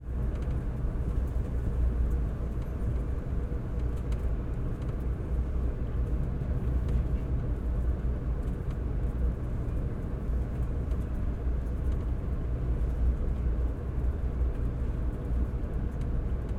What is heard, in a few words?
Train, Diesel, Passenger, Ride POV, First Class, Decelerate, Stop, Distant Brake Squeals